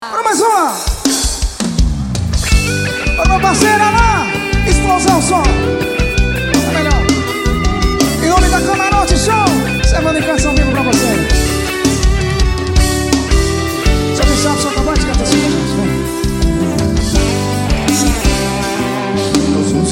forro verao

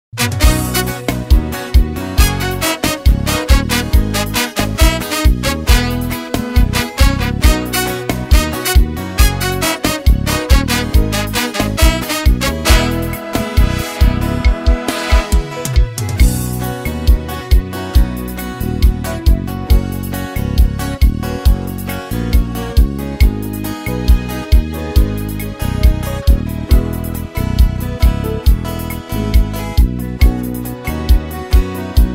romantico arrocha

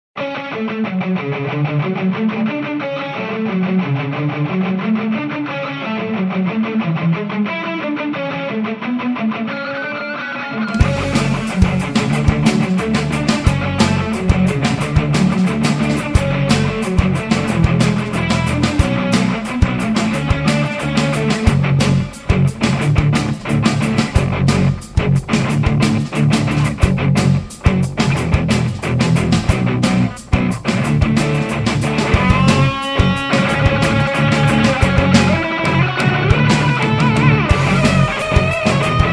instrumental

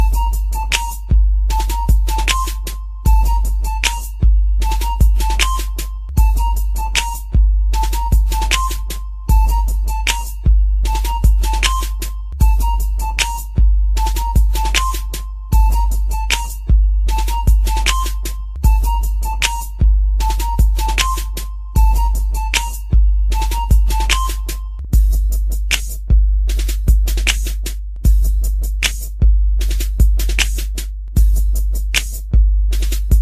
toque

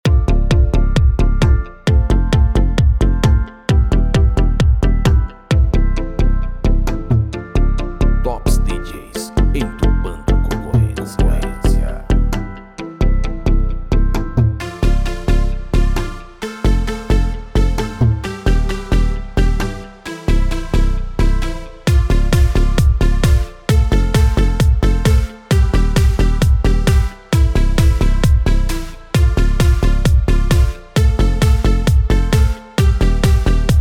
impacto automotivo

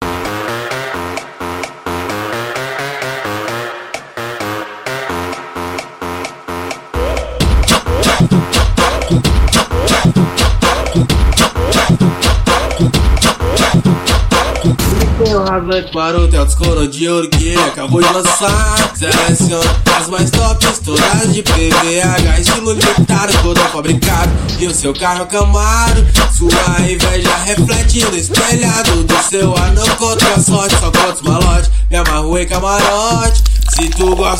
funk ostentacao

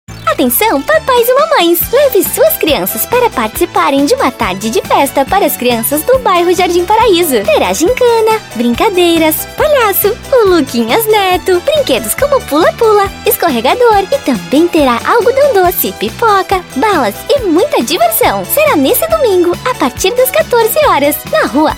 infantil

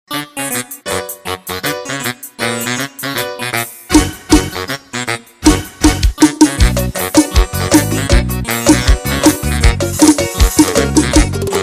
forro

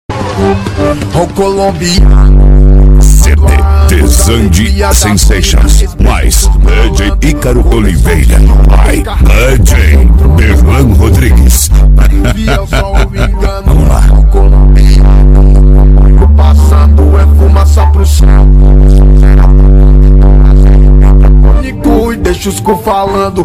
Calambiano grave, Funk graves

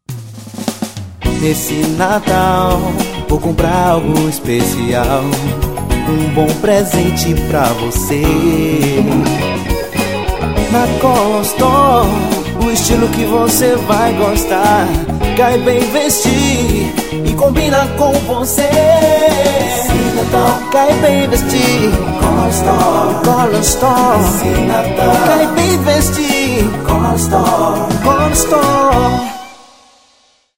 jingles natal alegre; color stor

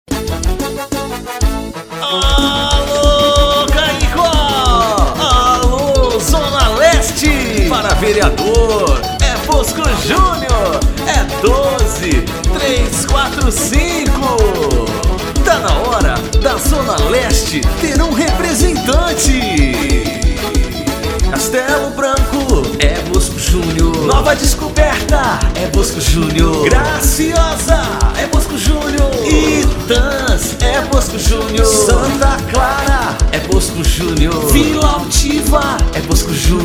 forro inedito jingle animado; chiclete

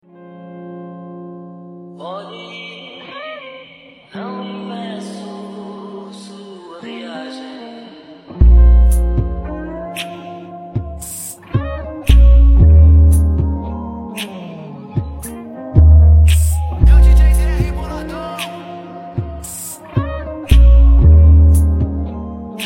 beat trap remix; pode ir

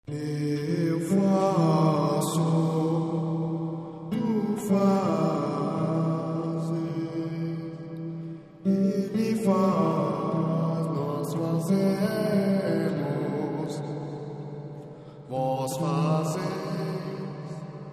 vinheta; vinheta monge; COCODILOS

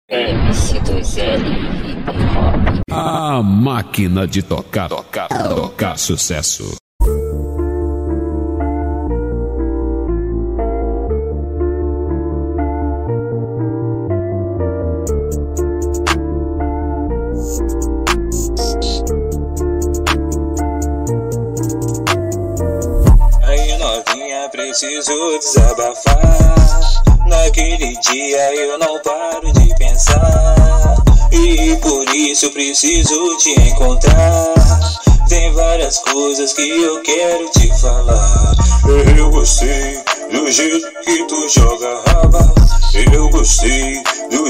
hip hop romance ficticio com vinheta

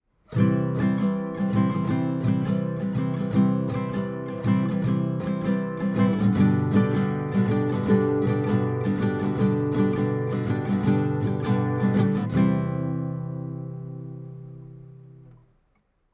levada rock

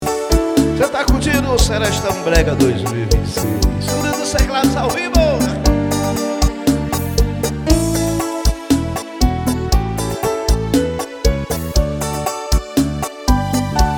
Serestão Brega, Ao Vivo

